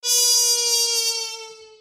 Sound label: Death Voice